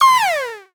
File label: Retro FX; Cymatics; Video Game Sample Pack Vol 1